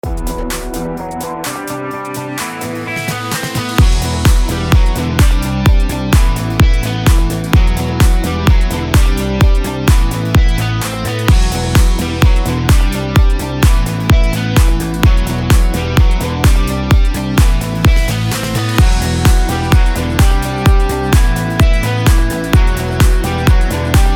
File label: BED ROCK EDM EMIN 128BPM